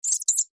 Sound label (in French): vampire chauve souris